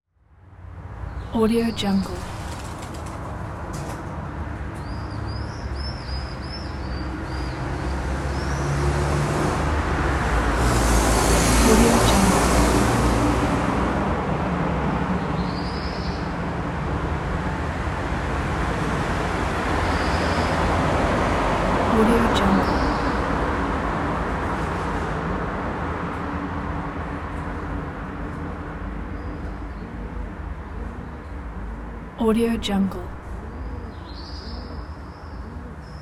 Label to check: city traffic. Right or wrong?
Right